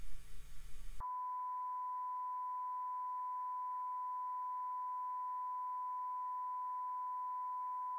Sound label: Sin calib rec; Alesis inside